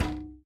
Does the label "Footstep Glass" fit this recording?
Yes